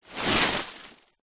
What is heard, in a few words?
foley shorts remove from plastic bag